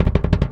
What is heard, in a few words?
Sustained Double Kick; Airwindows